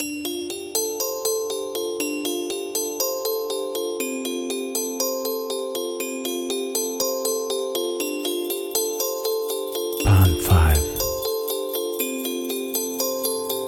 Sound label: Christmas Countdown Clock; Pond5